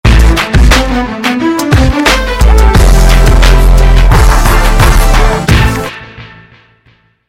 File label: Awesome Bass Tone